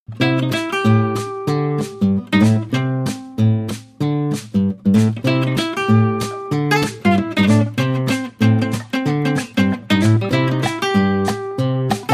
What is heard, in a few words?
No Banjo and Horns